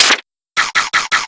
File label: paper eating; dobinbough